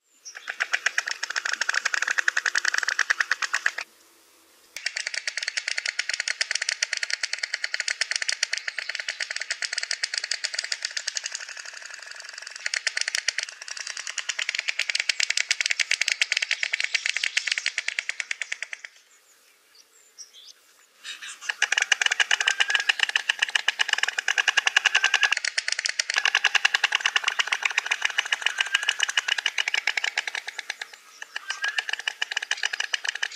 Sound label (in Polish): glosy ptakow bociana wrobla sroki; Głosy ptaków (bociana, wróbla, sroki); Ptasie koncerty; Katolickie Niepubliczne Przedszkole OGRÓDEK ŚW. FRANCISZKA w Siedlcach